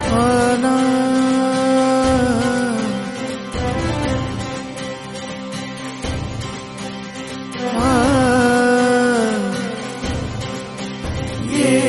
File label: Yoga Anthem Hindi